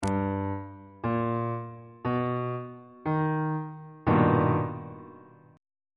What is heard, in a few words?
a double sharp augmented major 7th 2nd inversion on bass clef